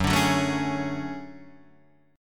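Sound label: D Major Sharp 11th G flat, chord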